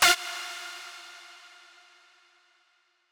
lead

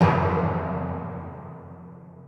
timpani

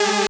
synth

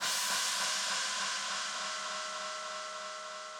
cymbal

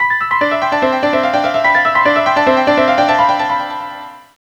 piano in; Angelic Layer

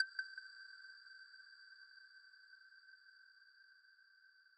arp

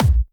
kick

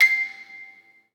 musicbox

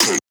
vox

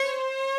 violin

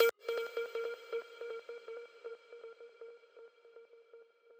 arp

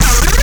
bass purge

